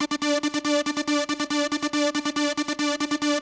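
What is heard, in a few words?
Trance G3; Techno; Melody; TechnoMusicMaker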